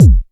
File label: 2000s fluffy electronic kick drum single hit f key